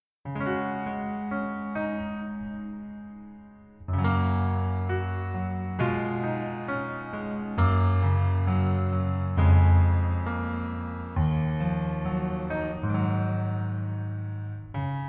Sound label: Piano ver